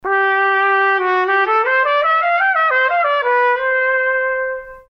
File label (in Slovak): trubka